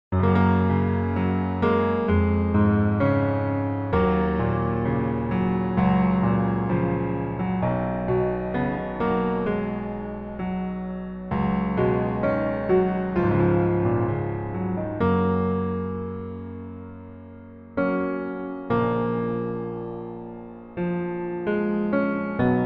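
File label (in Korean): Piano version 남자키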